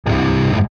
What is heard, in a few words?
power chord